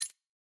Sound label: reward hover